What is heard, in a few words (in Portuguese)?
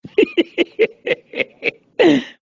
risos